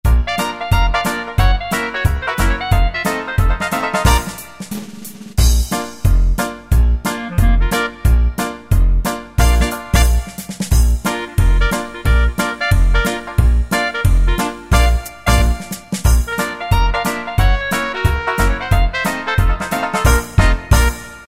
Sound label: Dixieland, Yamaha QY70 Preset Styles